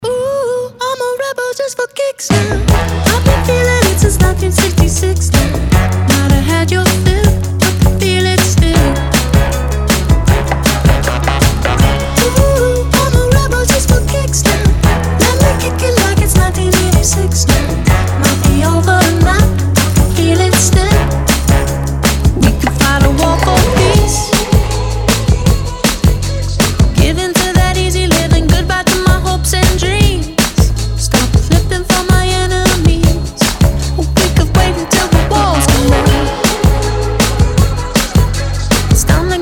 alaskan indie rock, ringtone

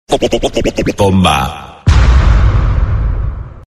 scratch message tone

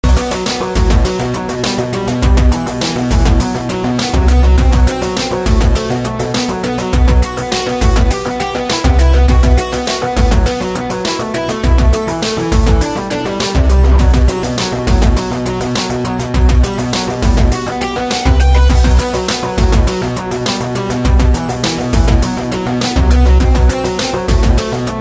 NES cover version; nes alien 3 level 1

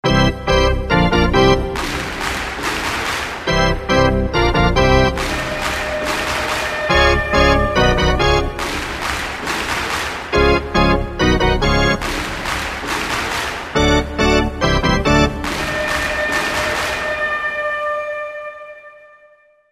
organ hockey music

